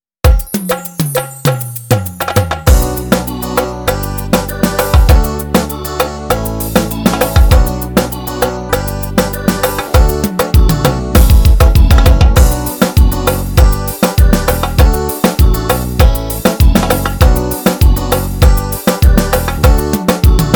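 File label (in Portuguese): ritmo yamaha levada axé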